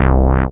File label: SYN SH, Roland; Sound & Vision - Gigapack I CD 2